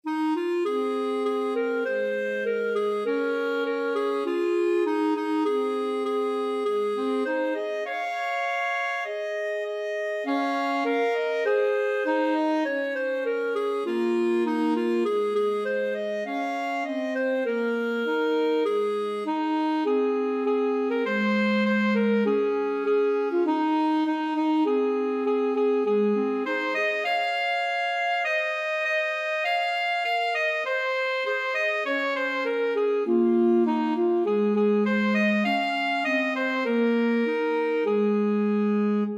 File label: clarinet saxophone duet